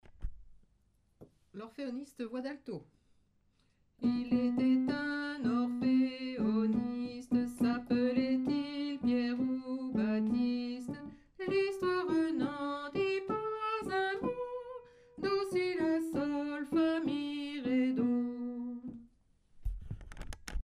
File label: alto; orpheoniste